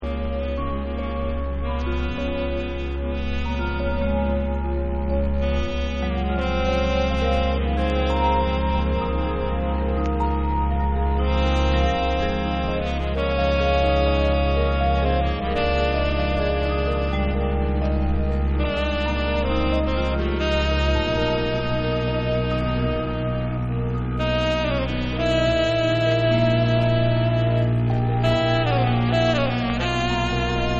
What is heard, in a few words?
Trio An Improvisation